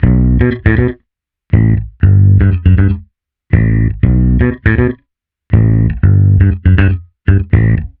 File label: Bass Guitar 120BPM Bm; 99Sounds x Monosounds; Instrument Loops